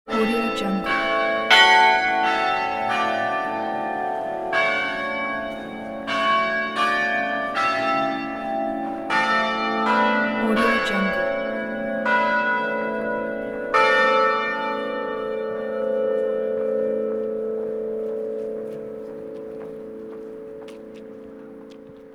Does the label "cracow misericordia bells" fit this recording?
Yes